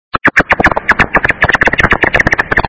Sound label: bat echolocation call